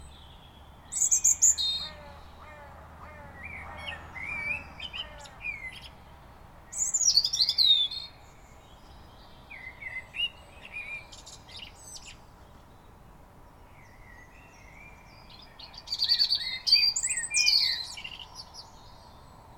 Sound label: Dawn chorus; Ed Drewitt